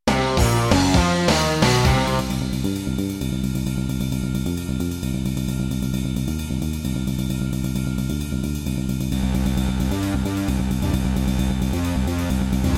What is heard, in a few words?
Roland SD-90 GM2MODE; sigil doom episode 5